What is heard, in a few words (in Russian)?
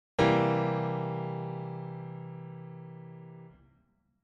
malyj mazhornyj septakkord